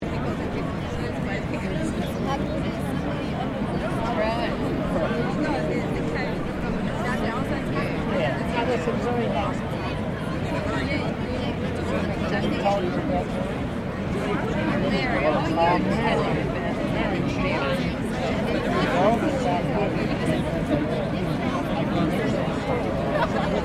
Crowd Large Outdoor Event Chatter, Outdoor event crowd; Crowds